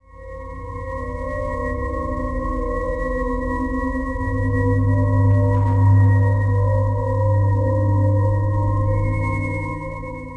cave; DAoC; Toa

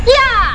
heeyah!; Renegade; Shelly Merfly

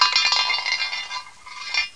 Jar Rolling; How Would You Survive (1995); Grolier

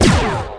laser cannon; SpaceFlight; XCOM

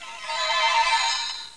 transition; Professor Fernleaf from the Tropics

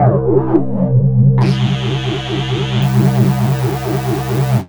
rise, Synth

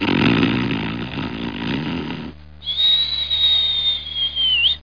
snore

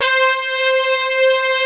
hit brass; cubic

